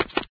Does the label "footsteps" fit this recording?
Yes